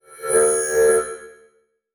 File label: Multimedia Internet CD-Rom Flash Electronic